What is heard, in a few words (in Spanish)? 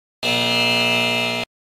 Efecto de sonido Error